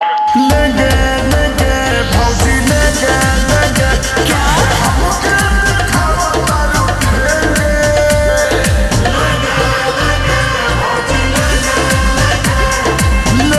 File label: Ringtone Slowed Reverb